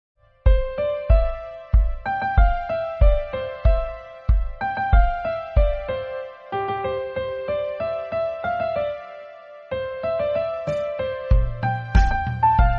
ringtone new ringtone love ringtone bgm ringtones hindi ringtone romantic ringtone